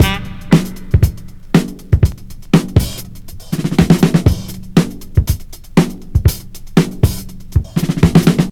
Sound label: 113 bpm breakbeat d key